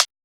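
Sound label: hi hat; E-Lab; X Static Goldmine 1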